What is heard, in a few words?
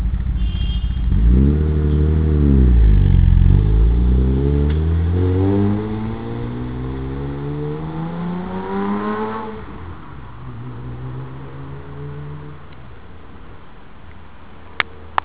exhaust outside